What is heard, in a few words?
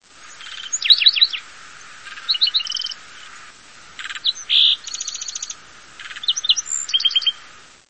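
various sounds of a sparrow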